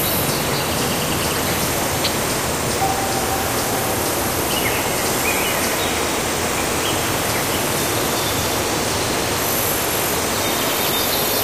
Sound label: courtyard birds loop; Left 4 Dead 2; Source Engine; ambient